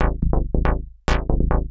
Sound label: TECHNO BASS 140 A